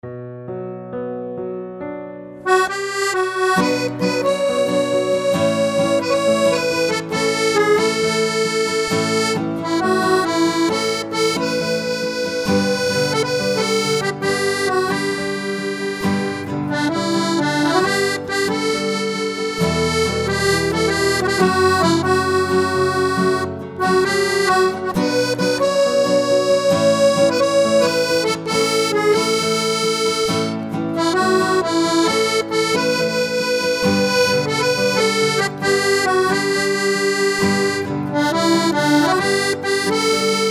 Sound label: instrumental